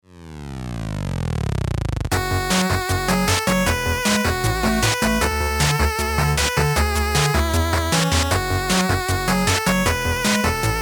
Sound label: Ride Style